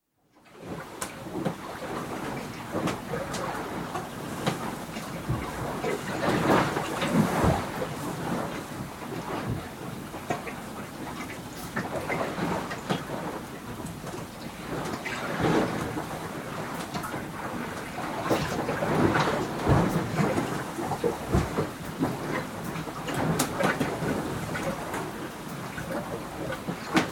Yacht, atmosphere in cabin under sail, wind force 4 (30ft sailing yacht, Halmatic 30, GRP, sloop rig); 'Alice May'; Boat; BBC